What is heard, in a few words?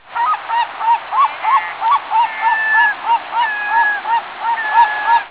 herring